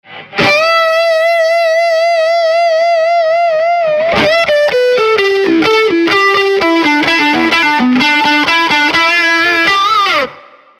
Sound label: slow version